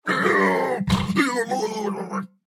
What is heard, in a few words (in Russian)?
Удар током; Турбосвин